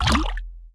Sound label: boat